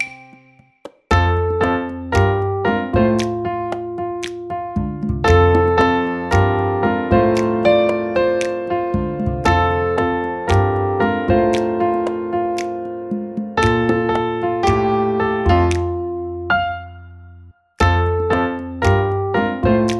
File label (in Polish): instr, WERSJA INSTRUMENTALNA